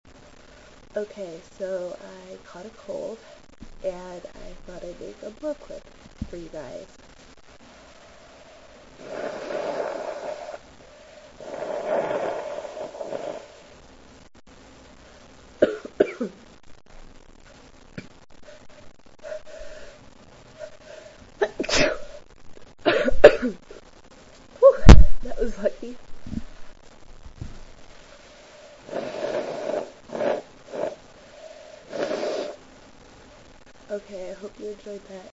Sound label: sick blows and sneeze, female